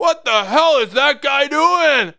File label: see death; Messiah